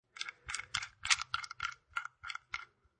Lock

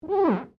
GLASS SQUEAK FINGER; Material components for constructing more complex sounds; Materials; Foundation

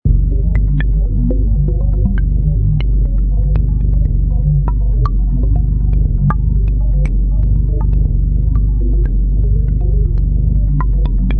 Weird Bassy, Deep and creepy evil bass sound; Deep Tone Sound Effects; LOW FREQUENCIES

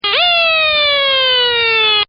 Siren; Sirens; Electronics; Partners In Rhyme

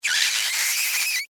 Squeak Rubber Burn

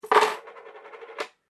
Coin Single Dropped Spin Wooden Surface; Common coins; Money Sound Effects; Money (Sound Effects:Objects)

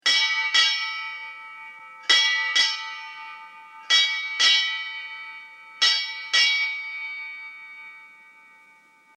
Ship Bell Antique 8 Hits Slow; Boats; Vehicles

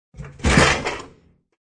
Dishwasher being opened.; Old Dishwasher Open; Kitchen; Household

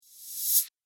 Whoosh Fast Air Cut

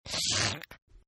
Sniff; Sickness Sounds; Sniffling; Human; Partners In Rhyme

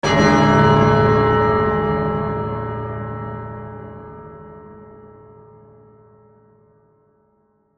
Hit Metallic Impact Gong Reverb

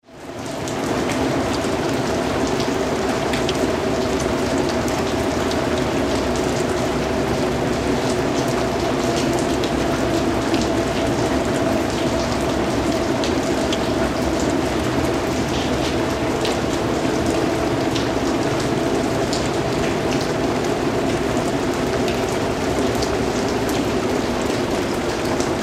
Weather Rain Alley Ambience; Rain Sound Effects; Rain (Sound Effects:Weather); SFX Bible 6

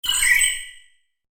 Alien Metal Droid Bird; Robot Sound Effects; science fiction sound effect

